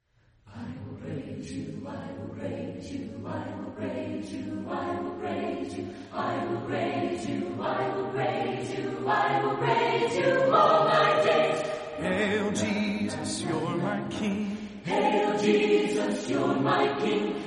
chorale, voices, acappella

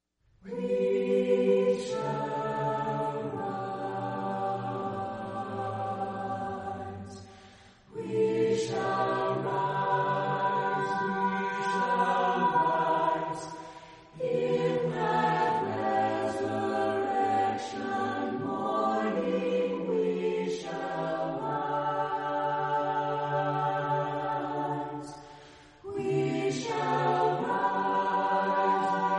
Chorale, Voices in Praise acappella

